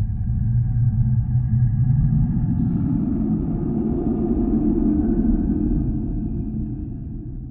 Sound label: ambience wind cave; everness